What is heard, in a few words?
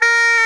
A4#, bagpipes; 90s Sample CDs; Club-50 - Foundations Roland